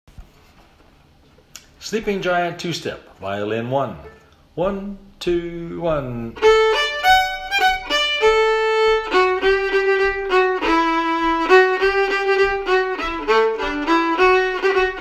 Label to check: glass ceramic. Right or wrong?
Wrong